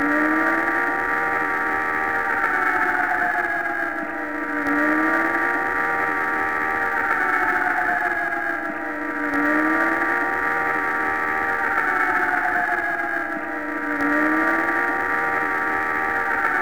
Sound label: alarm; Soldier Of Fortune II Double Helix; XBOX; ambience